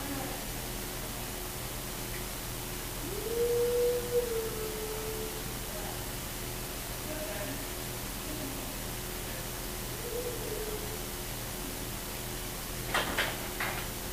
whoohoo

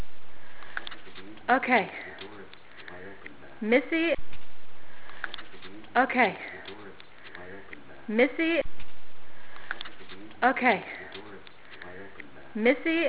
door

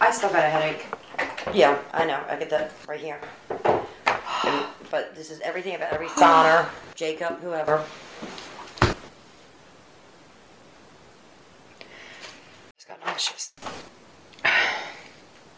surge in background some bang too; pain blurry vision and headache with goosebumps

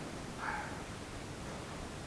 hi or cough

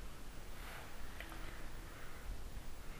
moan

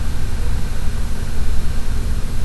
dog barking

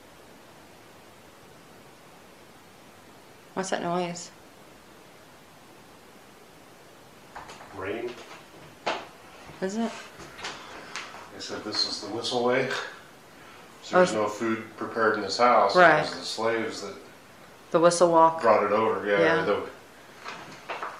whistle stop it

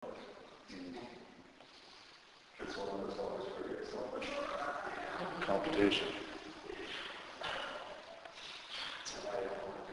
another laugh

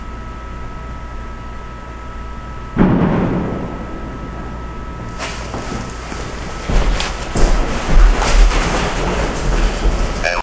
old jail bang; CP old jail july 16th

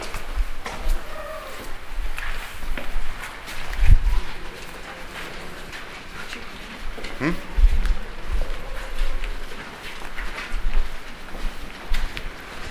scream

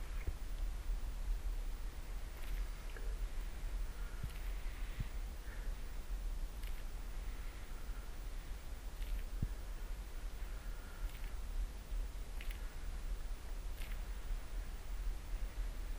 unexplained footsteps

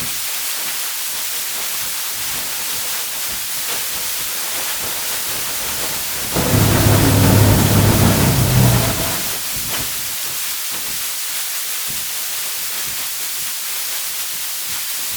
demonic growl

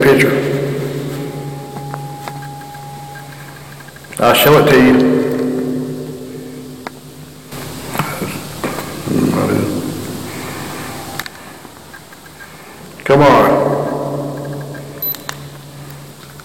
grunt

